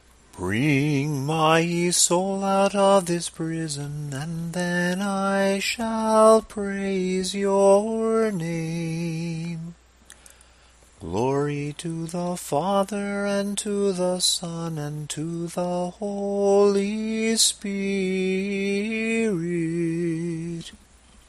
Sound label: Tone 1 samohlasen verses; prostopinije